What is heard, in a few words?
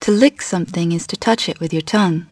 lick